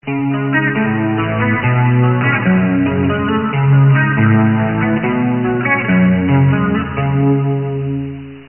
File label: bottle